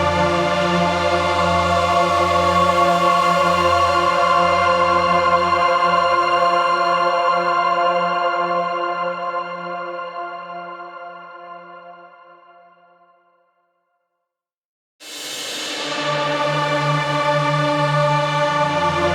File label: Void Tunnel Travel End Music